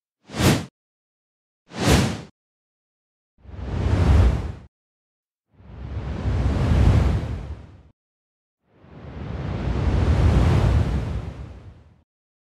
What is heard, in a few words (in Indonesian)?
efek suara transisi angin